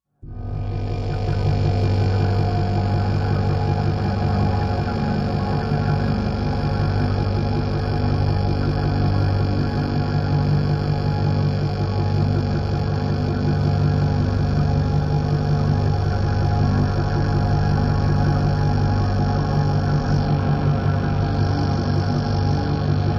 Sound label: Idle Buzz, Machine, Idle, Buzzing, Electric, Drone; power core hum